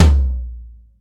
Low Mid Tom Single Hit B Key, Royality free tom drum sound tuned to the B note